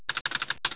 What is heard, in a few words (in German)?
Tippen; RPG Maker; Wartools v1.14